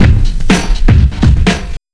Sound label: BIG LOOP, Big Beat Drumloops; USB Soundscan vol.25; AKAI